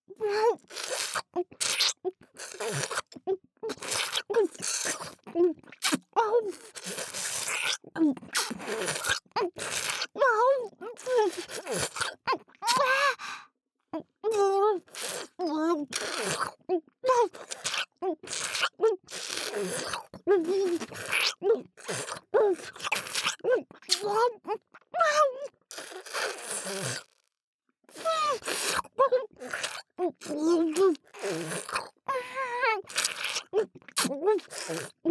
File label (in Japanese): ピストンフェラチオ（激しいVer）【ループ】; ループトラック